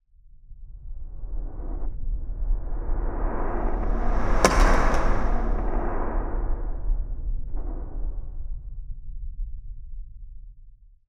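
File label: Reverse Boom Roll Off; Cinematic Metal Impacts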